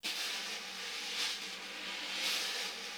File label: Vintage Snare Drum; Q Up Arts; Johnny.C's Vintage Snare Drum; 5X14